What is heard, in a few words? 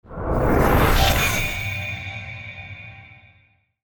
run successful; jinteki